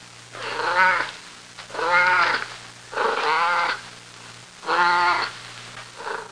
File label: Dogs growling vintage